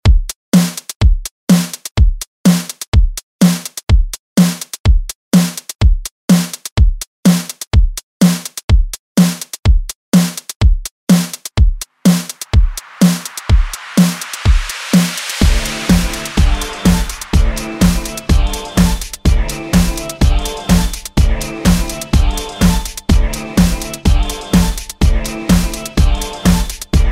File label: remix, 124bpm